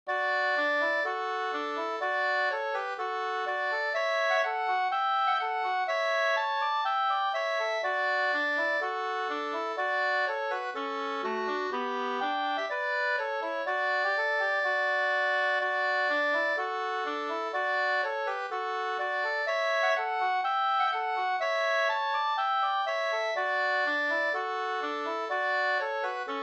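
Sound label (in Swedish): brudmarsch Kyrkmarsch fr Norsjo Västerbotten 2 stämmor; Hilma Burvall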